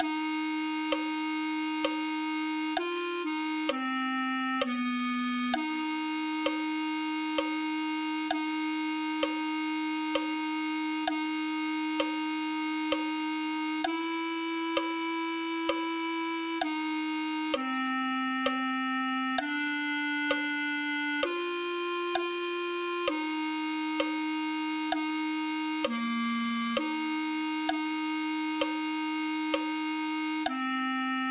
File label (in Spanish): clarinet1